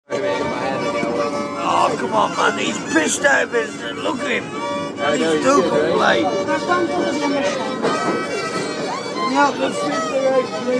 busker playing his violin on my friday night underground train trip home